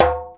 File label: JEMB, DJEMBE; Univers Sons; Basicussions